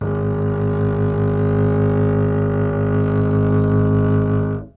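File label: String contrabass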